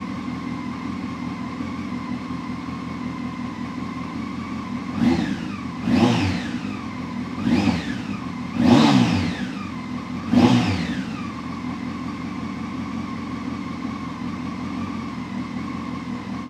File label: Modded Exhaust 14ft in front, CB900F; Bafflectomy